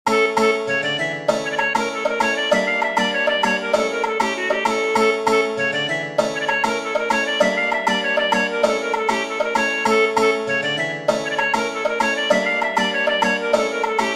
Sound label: cocek